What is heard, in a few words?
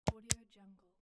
gamepad controller main button